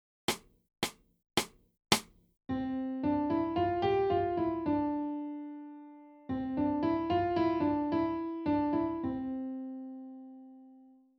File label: STRAIGHT MELODY